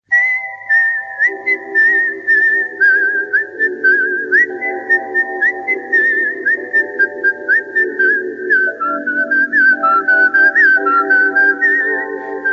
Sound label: lovely romantic whistle song tone